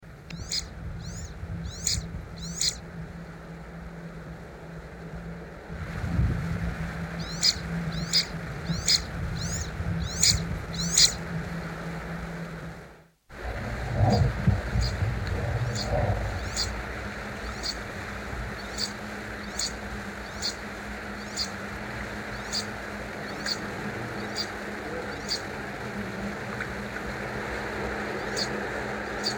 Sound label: auckland island teal song; conservation, native animals, birds, bird song